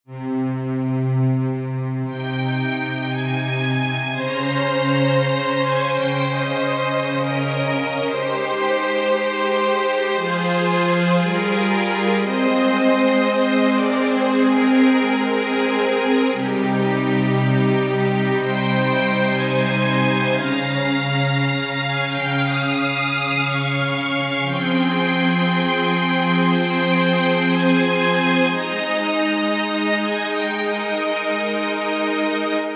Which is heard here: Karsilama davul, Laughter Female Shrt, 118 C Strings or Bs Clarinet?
118 C Strings